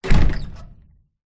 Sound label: household front doot close int perspective med rattle insect screen other side; zapsplat